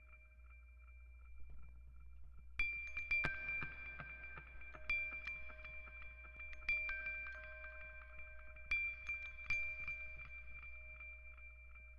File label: Music Box